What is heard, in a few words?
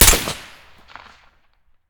svd silencer mono, weapons